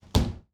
Shower Close Door, Household

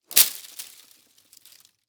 Impact Tree Branch Fall To Ground

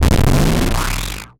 Title Transition Descend; Production Element Title Transition

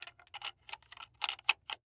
Weapon Bullet Shell 270 Rifle Load Round; AdobeCC

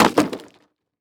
Impact Plastic Dropped On Dirt; Impacts

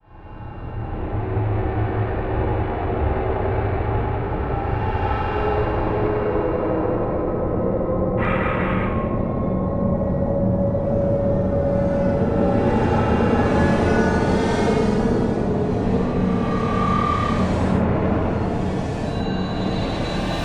Choppy Reverse; Module Production Element Title Imaging; Imaging Elements

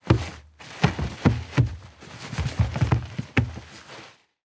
Human Crawl On Wood Floor

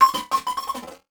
Multimedia Internet CD-Rom Flash Beep Multiple

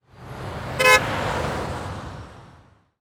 Transportation Car Drive By With Honk

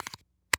Weapon Pistol Load Clip; AdobeCC

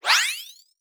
Wipe Cartoon; Multimedia Internet CD-Rom Flash

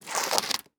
Impact Plastic Tub Dragging Over Cement; Impacts

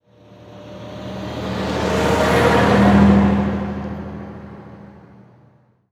Truck Ford Ranger Drive By Slow; transportation

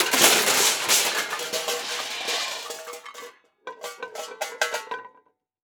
Crash Pots And Pans Falling On Kitchen Floor; Crashes

